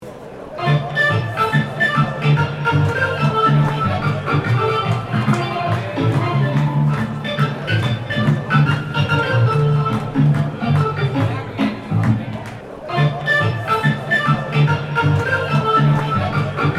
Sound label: Organ Ringtone